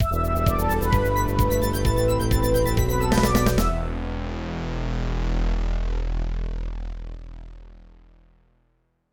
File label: level complete; streets of rage; arcade; genesis; master system